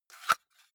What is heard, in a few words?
cassette case insert medium; objekte; kassette